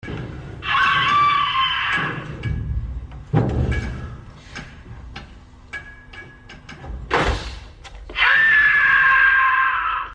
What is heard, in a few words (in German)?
creep schrei